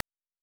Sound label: land; Pico